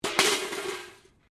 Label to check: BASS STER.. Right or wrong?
Wrong